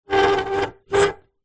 metal lever or hinge rusty old movement squeak creak; zapsplat; foley